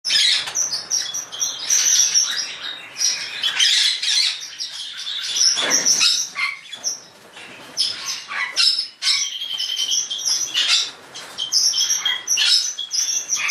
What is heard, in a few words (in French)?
psittacoms couple de perruche port lincoln barnardius zonarius